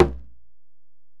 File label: Ac Perc, Drum Machines Alesis DM-PRO